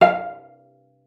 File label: F5, CELLO PIZZ; Xsample VOL-5